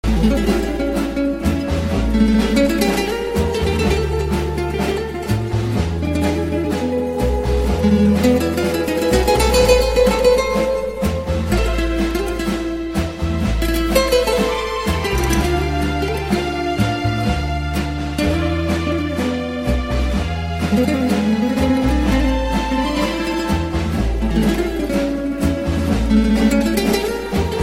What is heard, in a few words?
melodious guitar